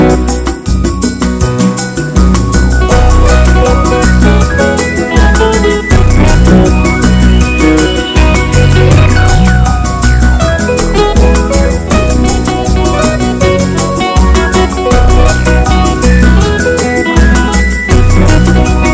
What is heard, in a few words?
Hot Bossa Nova